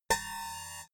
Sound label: typewriter single